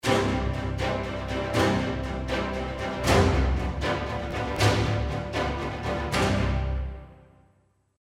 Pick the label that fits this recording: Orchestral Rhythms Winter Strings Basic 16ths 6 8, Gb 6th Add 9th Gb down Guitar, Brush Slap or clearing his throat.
Orchestral Rhythms Winter Strings Basic 16ths 6 8